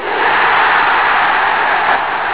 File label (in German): tor, soccer; firearms